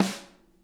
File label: SNARE, Interactive Drum Kits; Sonic Reality